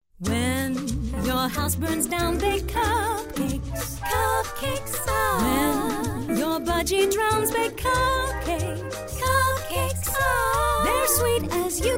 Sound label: Jingle